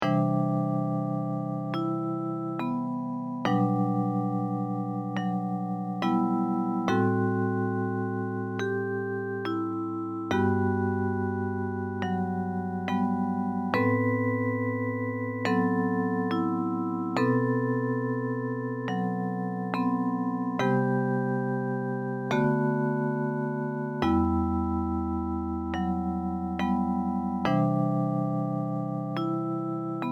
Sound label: short melodies short melody